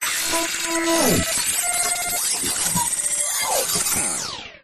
science fiction cyberpunk computer equipment or machine chirps beeps glitches; zapsplat